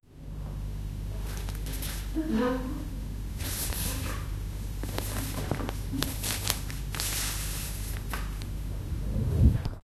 doctor covers dead body i, cloth

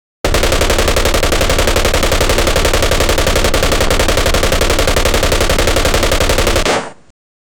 quick gun fire sound arca; arcade

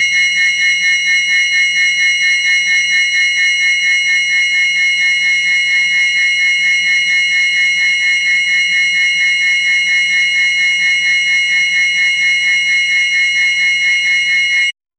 urgency alarm sound alert; buzzer

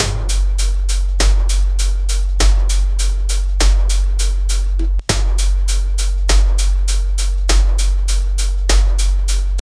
beat machine kick drum

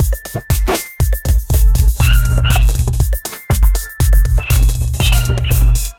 disco beat drop sparkly t

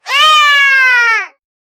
kids screaming yeah; cheer

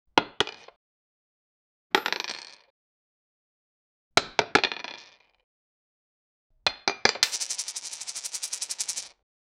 dice shaking in glass; dice shaking in glass and

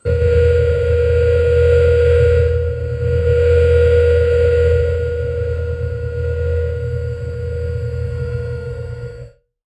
dense mechanical whir, powering on

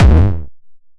synthwave blast

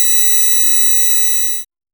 crisp magical, discovery

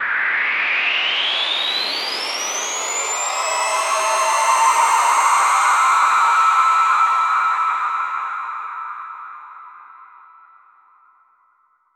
riser

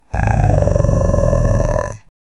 beast growl

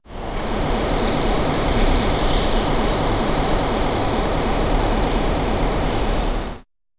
sunrise in mountains

